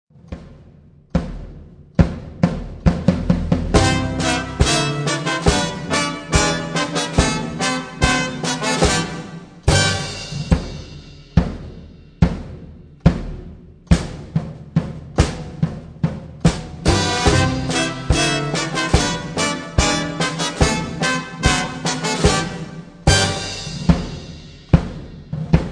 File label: instrumental